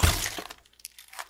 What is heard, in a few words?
zombie death; Zomboid; More Zombie Death Sounds